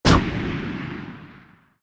explosion

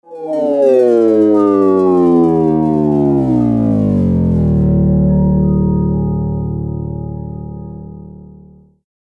move down slow

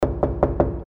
knock door

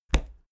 step wood step

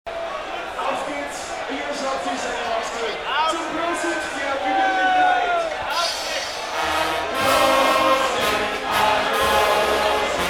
octoberfest tent cheers

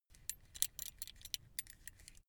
metal belt buckle clatter long soft

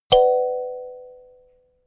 notification kalimba triad minor high

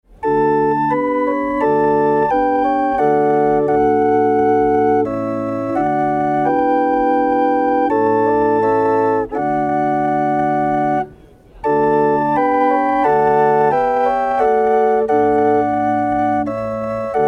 hurdy-gurdy

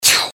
human tjuuh

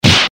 eastern fist hit seq

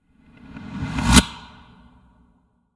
reverse suck in short reverb

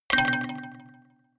notification kalimba delay third

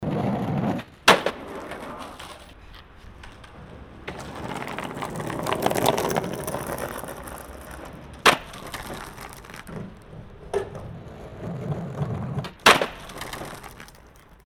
skate board passing

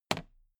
toolbox handle bounce heavy

